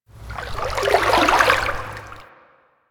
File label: Water Lapping Gentle Medium; Ambience; Warframe